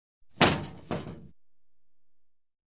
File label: Audience Applause